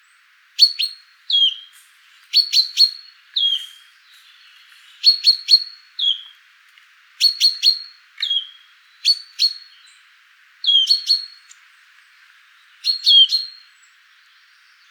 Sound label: call, chaffinch; It seems a sort of vocal duel among the two birds; Background: Wood warbler call and wind noise.; fringilla coelebs